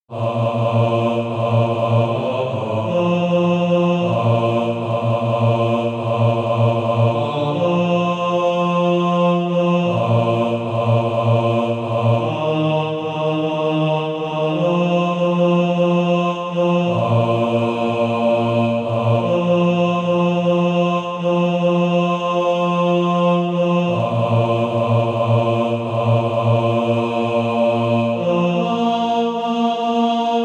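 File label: bass, Practise Music; Forever with Jesus There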